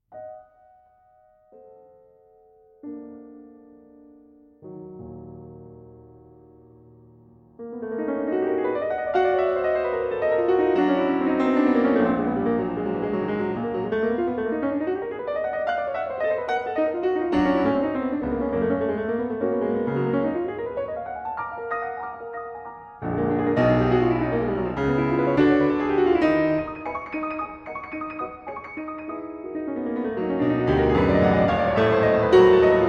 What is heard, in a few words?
Etudes-Tableaux, E-Flat Minor Non Allegro